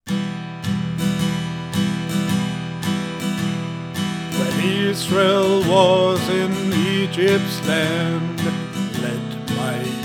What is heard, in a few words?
Go down Moses Swing